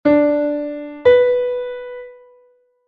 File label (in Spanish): 6ªm Re3 Si3; intervalos musicales; ¿Qué intervalo suena?